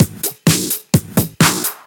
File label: Straight Loop 128 BPM, House